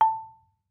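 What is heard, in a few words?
Marimba rubber fortissimo A2; MIS Pitches - 2014; Percussion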